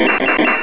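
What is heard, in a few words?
robo magic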